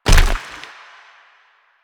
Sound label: Proto Infested Runner Step Default; Warframe